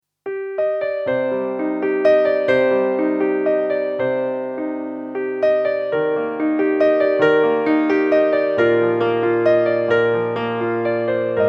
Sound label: Roland SRV-2000 Piano Large Hall